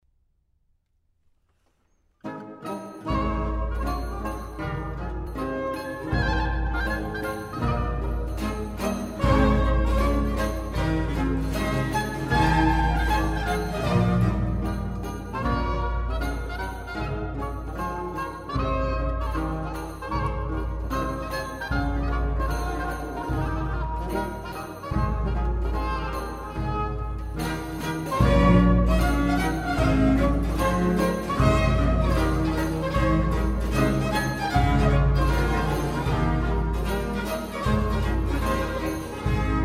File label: Gavotte; Le Bourgeois Gentilhomme; Lully; Jordi Savall; Le Concert Des Nations; L'Orchestre Du Roi Soleil